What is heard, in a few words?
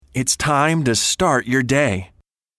Mobile Ringtone